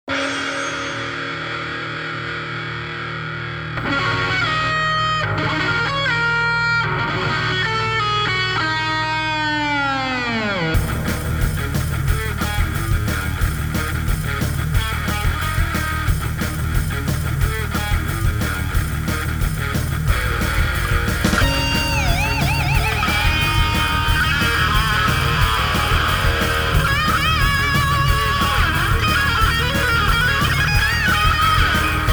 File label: insane metal